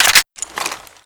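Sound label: weapon foley steyr aug reload clip in; Call of Duty Modern Warfare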